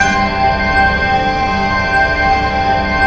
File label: IMPULSE; NorthStar - The Wizard VOL-2